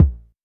Polite kicks A1, Short; LIMAT Div Dept; Drums

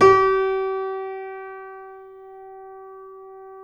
C7 F, The Ultimate Piano Collection; East West

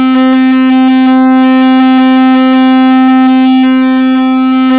PWM random, pads; Zero-G Ambient 1

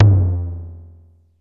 LOW TOM, DRUMS; Music Store Sound Service; Einstein

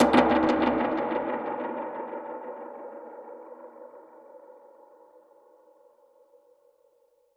Perc Hit, dub percussion; musicradar

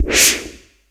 DANCE SET, DRUMS

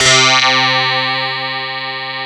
DS8 C 3, Korg; Back In Time Records; Korg Universe VOL-1

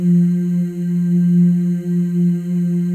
FEM, Symphony of Voices; Spectrasonics

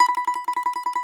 BAGLAMA T1BL; E-MU Producer Series Vol. 7 – Old World Instruments

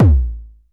KICK, DRUMS; Music Store Sound Service; Einstein

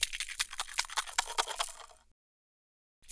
TIN CAN; ILIO - Ethno Techno

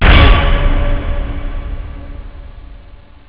orch hit; jazz jackrabbit 2; M8

